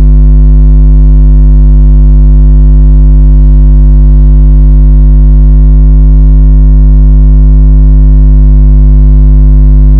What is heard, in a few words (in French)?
Mesure bouilloire 1100W